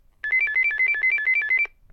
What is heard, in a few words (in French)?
Telephone GIGASET DA710 Sonnerie